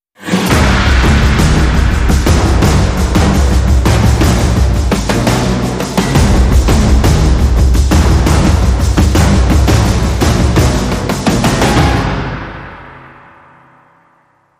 Tribal Fighting, Jingles

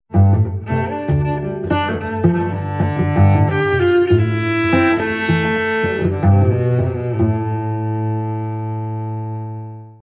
Cello Suite 2 Variation 3

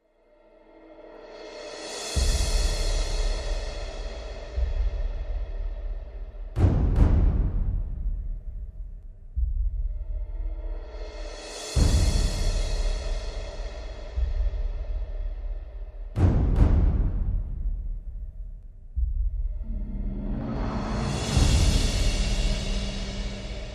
Percussion Only

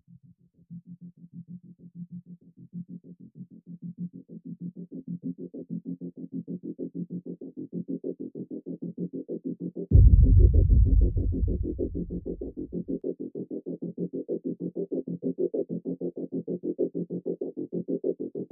Rhythm Only

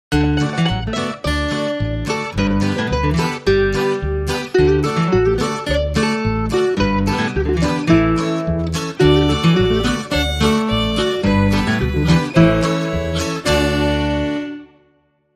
Afternoon Buggy Ride, Sting

